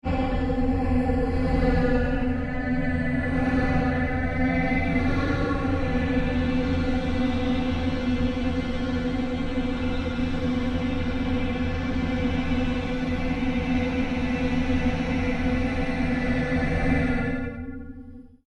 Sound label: Created Drone Short, Drone Sound Effects; sound building blocks created through manipulation of raw material